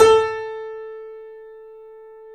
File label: 3D Boesendorfer, Pianos, A; E-MU Producer Series Vol. 5; 3-D Audio Collection